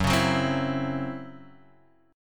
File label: C sharp Minor Major 7th Double Flat 5th F sharp, chord